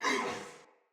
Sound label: Pain, Barks Battle Soldier; Sinecure Soldier